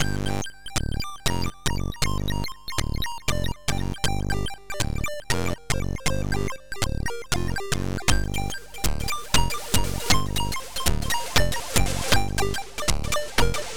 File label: Funk remix; Forest Funk